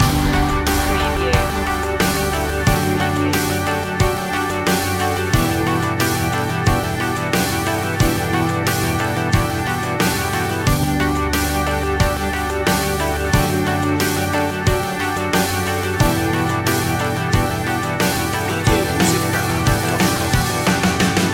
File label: sunshine trip loop